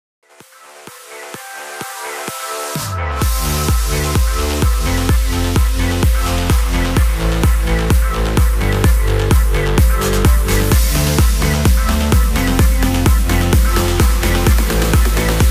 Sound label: 128 BPM